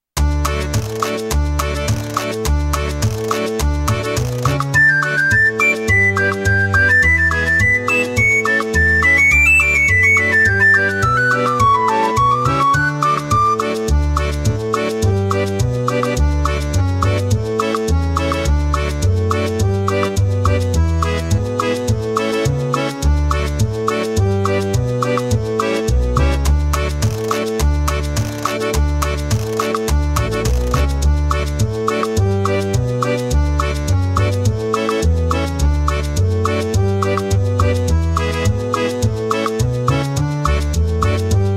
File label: instrumental; grade 3